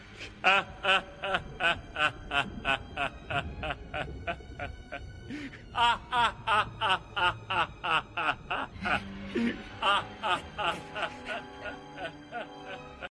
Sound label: Crocodile laugh